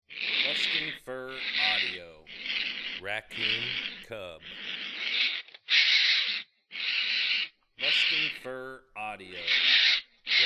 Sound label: Raccoon Cub